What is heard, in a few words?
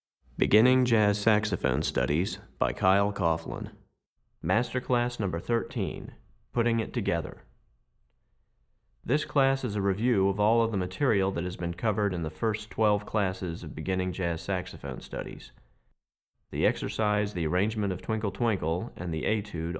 Tenor Class, Saxophone; Putting It Together; Master Class #13; Recordings For Beginning Jazz Saxophone Studies for Tenor, Volume 2; Kyle Coughlin